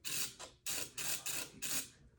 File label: cadence refresh